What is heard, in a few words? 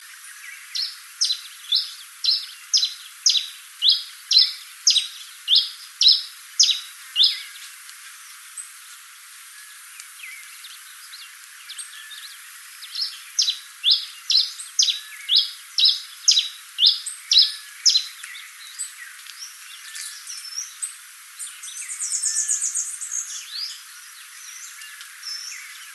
coryphospingus pileatus